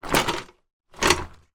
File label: industrial sack barrow movement set down; zapsplat